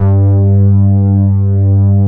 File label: MUTED PADS; Pro-Rec - Synthsonic